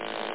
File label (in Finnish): leikkuri; ampubeta